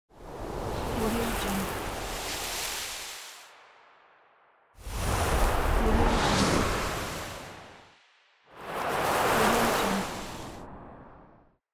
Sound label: snow